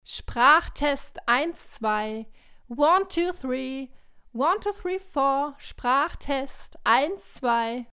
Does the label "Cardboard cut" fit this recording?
No